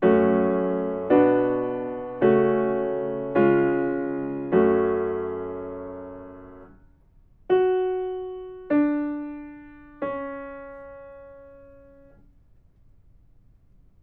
melodic fragments; ear training

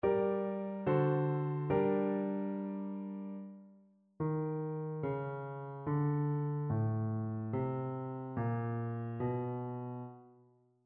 melodic fragments; ear training

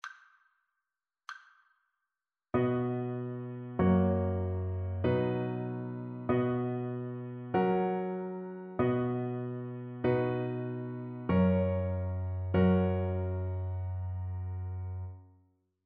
harmonic dictation

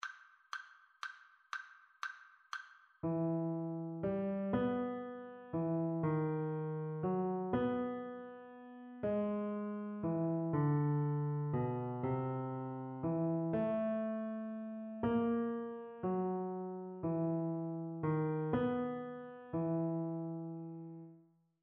melodic dictation; eartraining

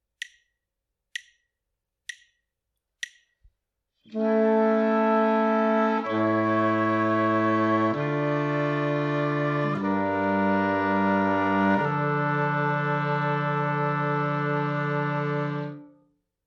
harmonic error detection; ear training

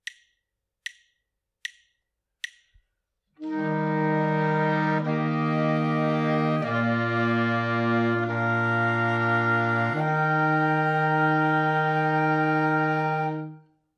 harmonic error detection; ear training

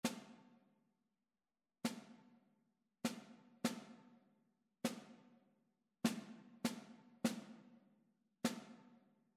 rhythmic error detection

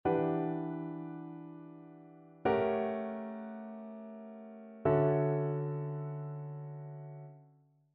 ii V I progressions; ear training

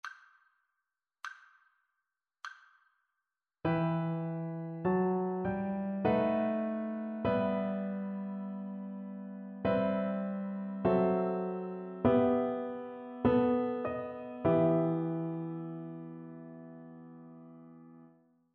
harmonic dictation

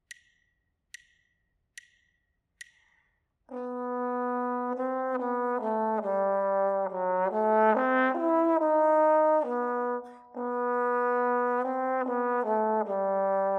melodic dictation

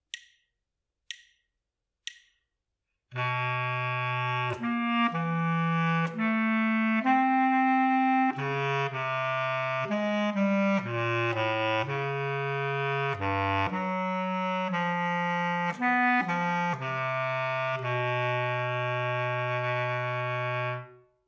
melodic dictation; ear training

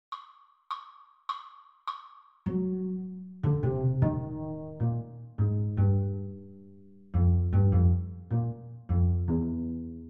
melodic error detection; ear training

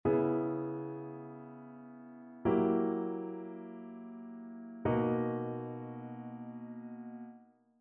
ii V I progressions; ear training

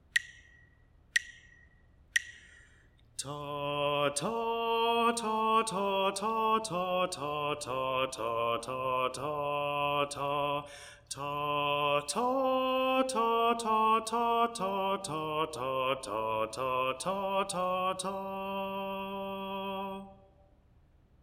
melodic dictation

